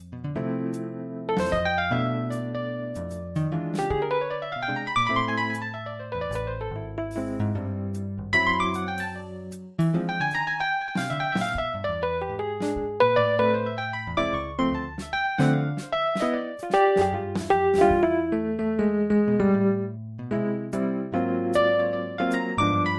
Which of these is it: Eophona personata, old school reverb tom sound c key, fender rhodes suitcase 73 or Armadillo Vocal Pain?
fender rhodes suitcase 73